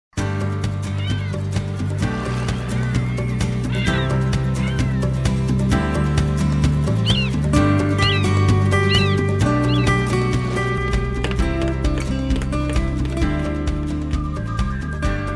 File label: Happy Theme Guitar VERY Happy